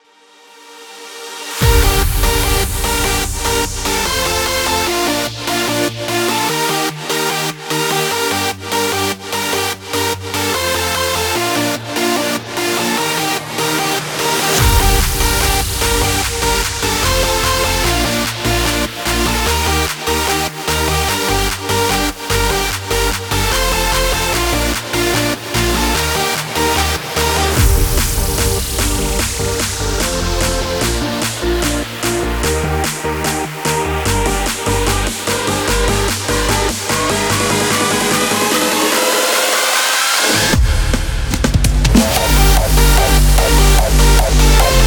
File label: Hardstyle lead template